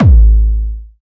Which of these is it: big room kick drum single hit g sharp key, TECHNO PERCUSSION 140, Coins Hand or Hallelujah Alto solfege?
big room kick drum single hit g sharp key